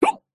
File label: human male single hiccup mouth closed; zapsplat